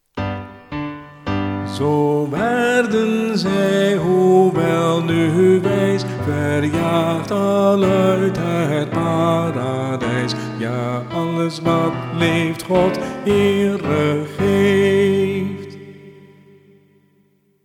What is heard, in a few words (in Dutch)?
lied met zang; kerstspelen; Zo werden zij, hoewel nu wijs